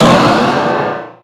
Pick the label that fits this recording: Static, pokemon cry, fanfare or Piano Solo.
pokemon cry